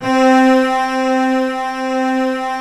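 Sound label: C BASS C1 left; SOLO C. BASS; Greytsounds VOL-1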